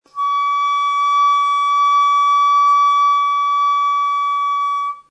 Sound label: C sharp 6, flute; classical